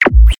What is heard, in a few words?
Transition; Rally Champ